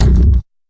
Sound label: fx drum